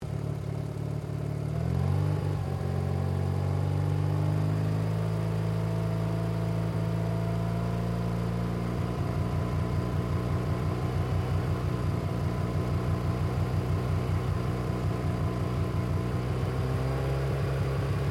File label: CAR MAZDA RX7 SERIES3 EXTERIOR BONNET IDLE THROTTLE UP